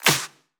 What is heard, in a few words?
Pre-Shifted Claps; Vengeance Samples